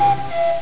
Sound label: CUCKOO